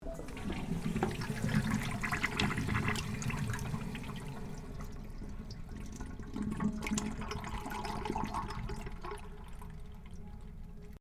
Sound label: toilet